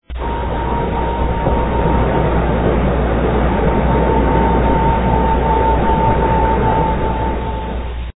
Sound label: vanguard I battery powered; This recording of Vanguard I was done while it was battery powered